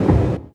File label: One Shot, Hits; Touch Loops; ElementsOne; Abstract Rhythms